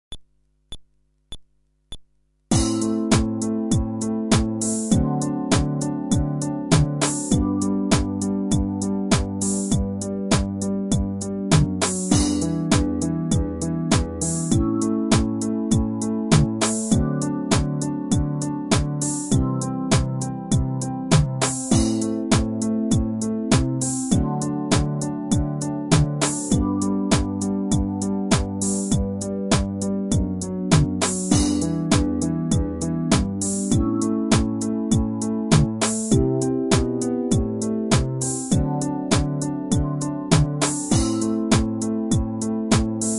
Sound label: Groove, fretless bass